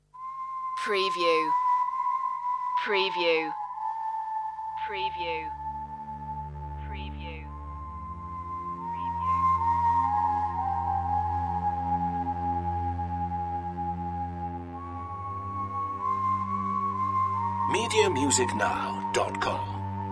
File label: ambient clouds